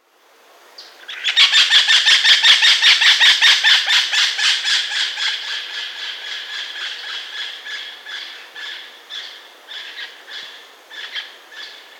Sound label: Pionus maximiliani flush; Ybyturuzu